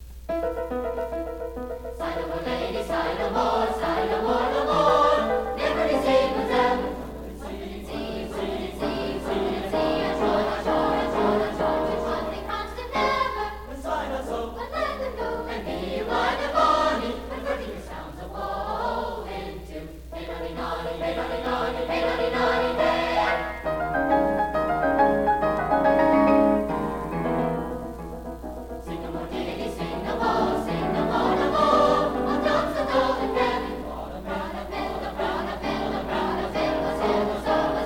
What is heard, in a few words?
Three Madrigals, chorus